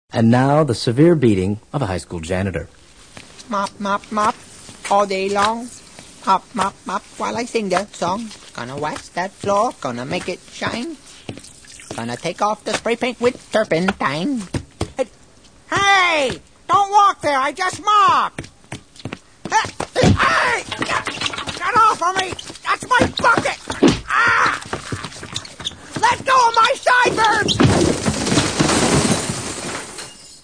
severe beating

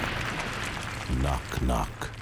knock

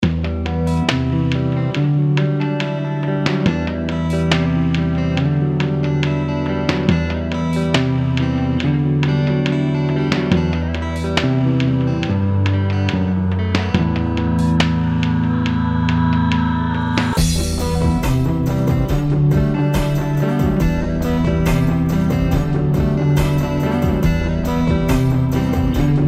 guit

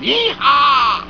YEEHA

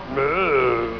Moo

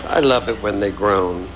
Groan

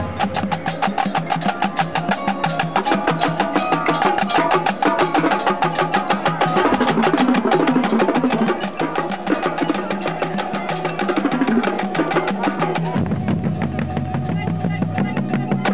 rumba, drums

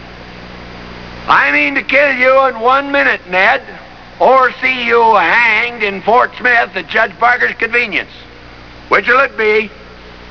sob

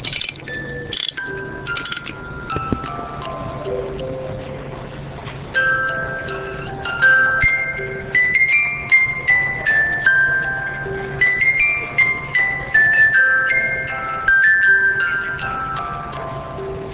Frosty Music Box

